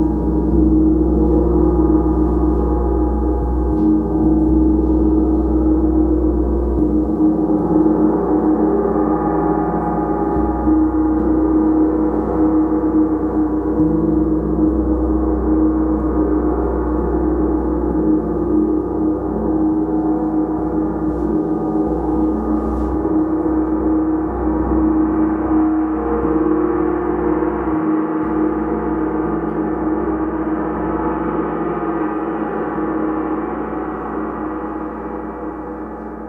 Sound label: gong